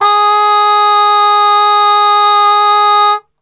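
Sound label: G#2 trumpet cup mute; Best Service; Brass Super Section; TRP CUP MUTE; 90 s Sample CDs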